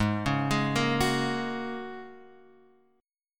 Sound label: G sharp 7th Suspended 2nd Suspended 4th G sharp